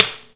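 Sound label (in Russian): две деревянные ложки ударили друг об друга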